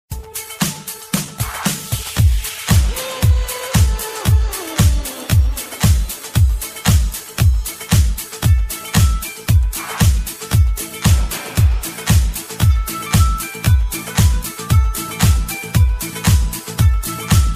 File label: instumental; guzarish; blues